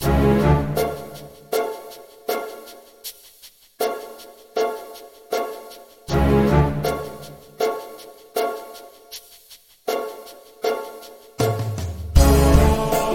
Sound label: Dungeon, bgm